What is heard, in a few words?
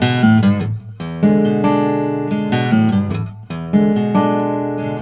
BARI RIFF, Blues Guitar Samples